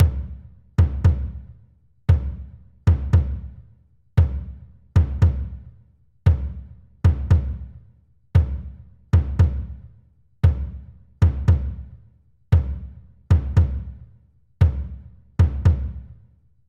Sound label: Samurai Deep Drum 115 BPM; перкуссии percussion loops